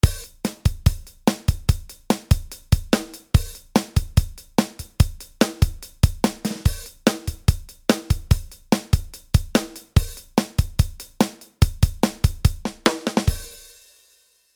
Dry Drums Fast

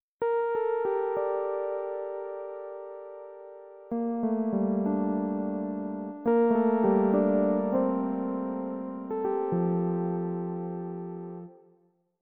Pianet

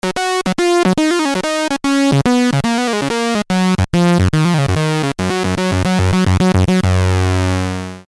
buzz

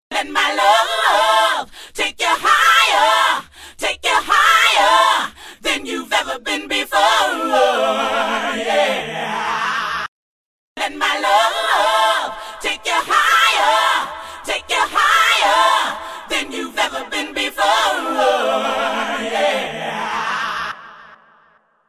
Vocals Female

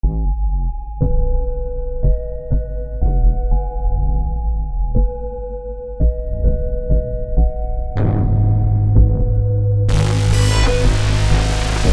Pickup

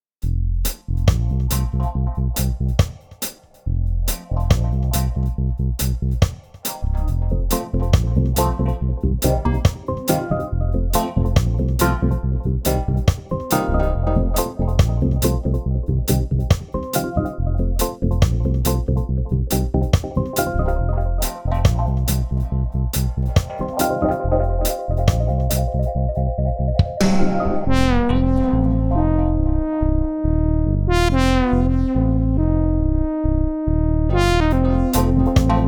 dub; ARA ORIGIN

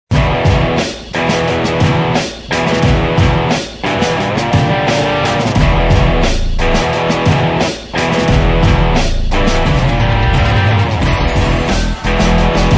TS7 Warm Over Drive

